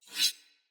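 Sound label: Dagger Whoosh Accent; Weapons; Player; Swords; Josalfar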